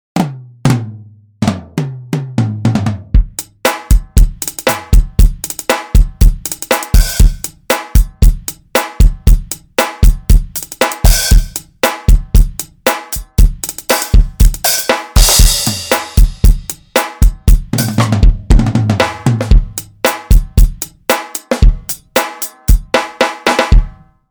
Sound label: drums